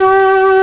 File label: sax; Winds